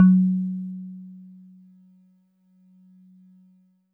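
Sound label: Warble Celeste DX100 F#2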